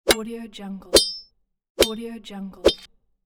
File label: sword